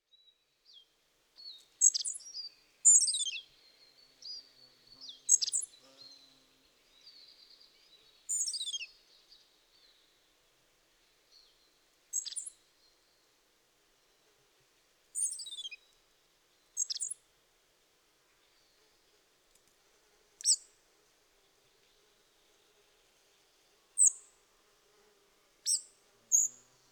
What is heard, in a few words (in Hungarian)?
szurke legykapo hangja